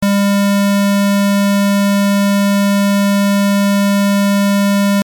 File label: 200 square